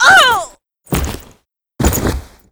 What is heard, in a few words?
Die